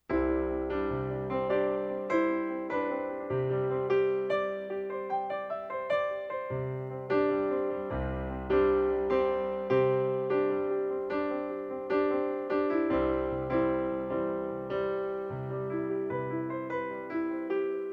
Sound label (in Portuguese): Piano Base Loops